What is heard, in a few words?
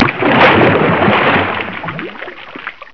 SPLASH